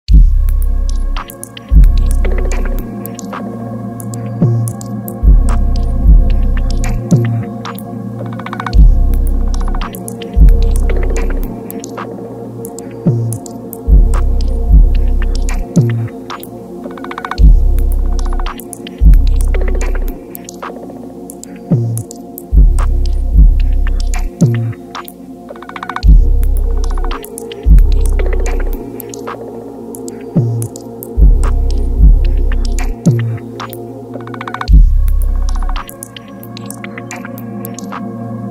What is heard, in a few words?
beat remix